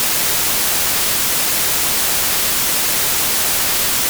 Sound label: psr noise flat; linearaudio